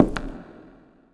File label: foot glass; Earth and Beyond; EA Games